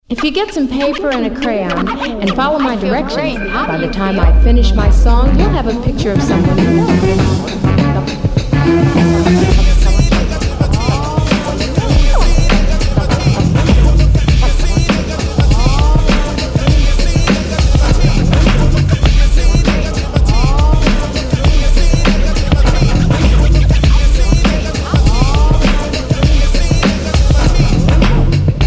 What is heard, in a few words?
instrumental